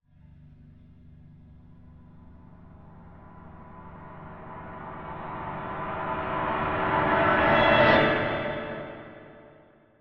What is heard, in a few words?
Swell To Impact; bottlerocket